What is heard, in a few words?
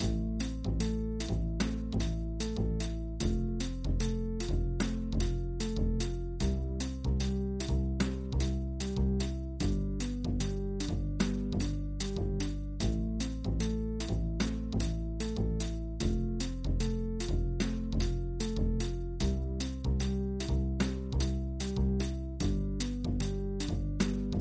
3 vs. 2 backing